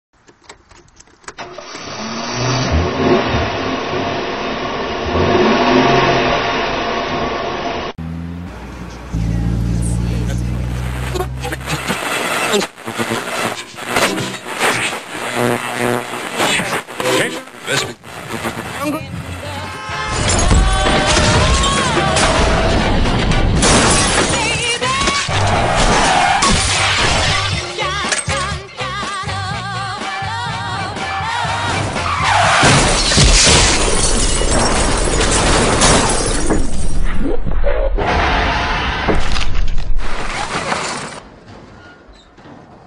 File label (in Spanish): historia con sonidos